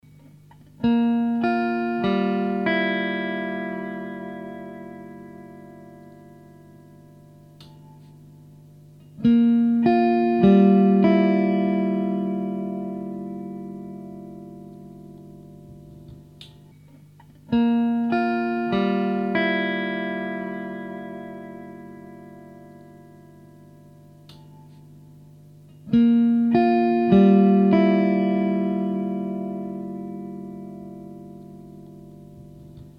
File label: Shine Push Pull